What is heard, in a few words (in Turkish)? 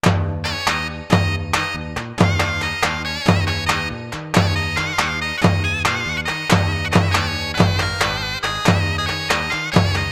BK5 folklor 5/8; caydacira